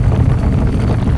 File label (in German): grollen